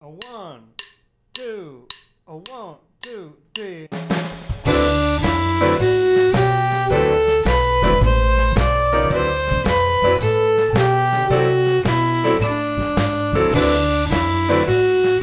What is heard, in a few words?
Play-Along The D Mixolydian Scale